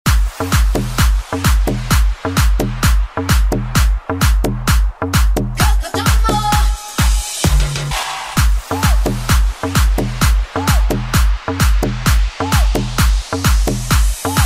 Guaracha; Deejay Hit

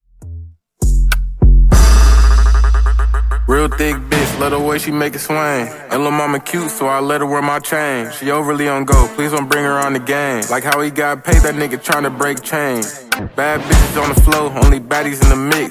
Dj Intro Outro 100bpm; remixplanet

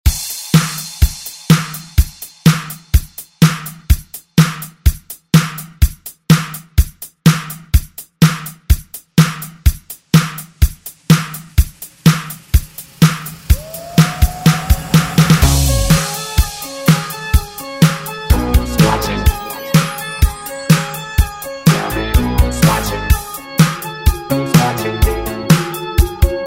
ReDrum; DJ Mhark

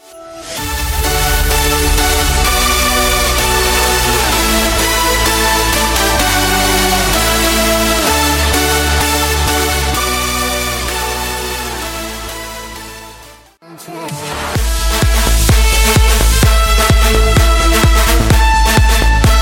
Christmas EDM Mix 128 134

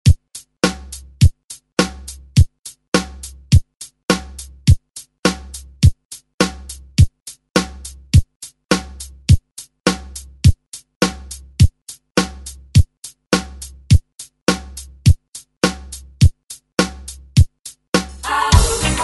80s Re Drum; DJ Mhark

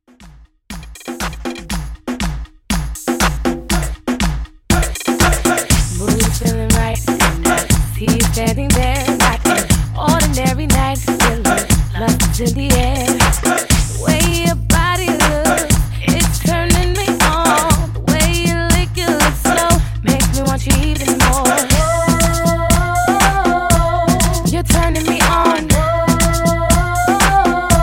Dancehall 120 Bpm

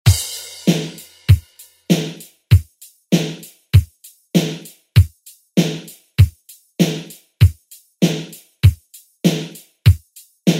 70s Re Drum; DJ Mhark

